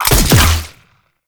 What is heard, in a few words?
Ink Rifle Alt Shot; Warframe; Tenno